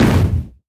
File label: Kick; Ableton Live 12 Lite; Core Library; Drums